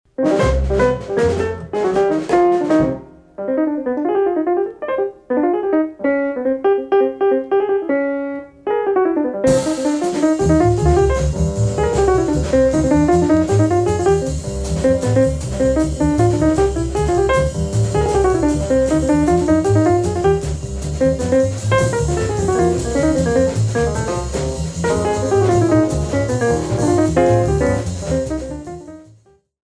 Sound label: Bebop